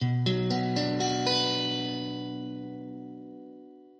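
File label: B min, Guitar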